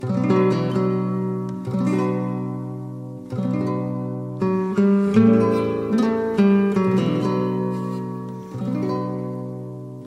Guitarra

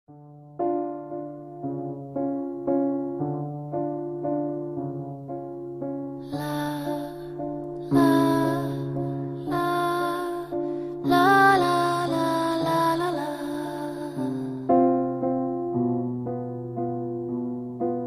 musica de fondo terror

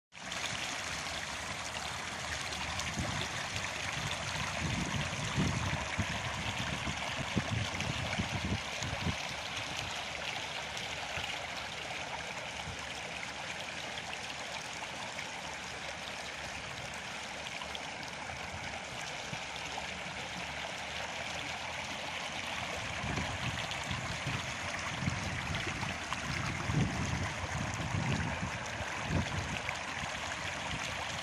SONIDO DE RIO CORRIENTE DE RIO

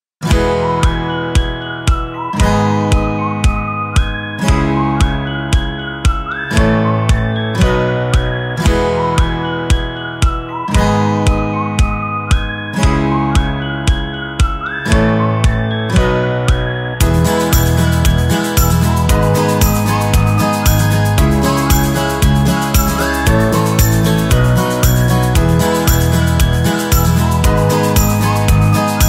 MUSICA ACUSTICA ALEGRE

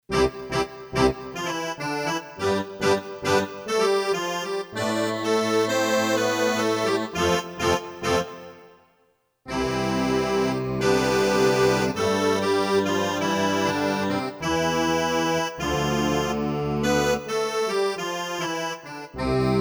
Acordeón

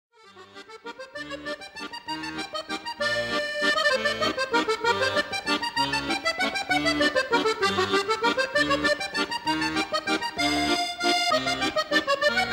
acordeon

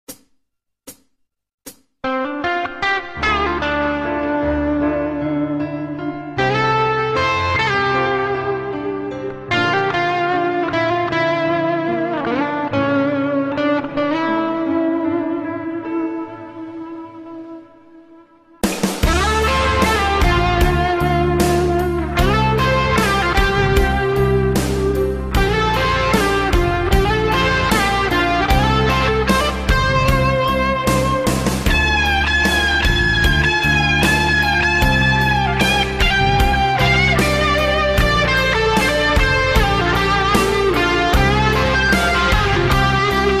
solo de guitarra impresionante

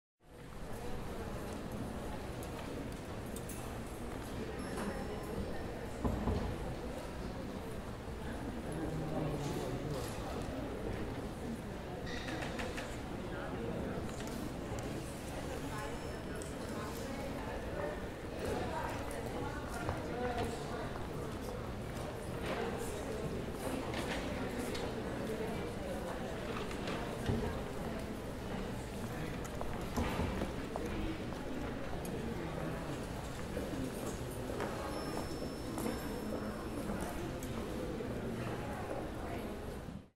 Ambiente en vestibulo de un museo, Museum lobby amb

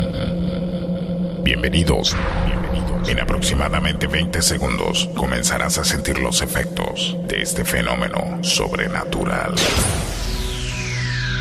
INTRO PRUEBA DE SONIDO

